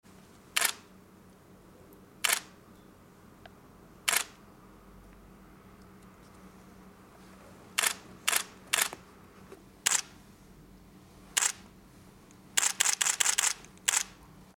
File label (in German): ausloeser fotoapparat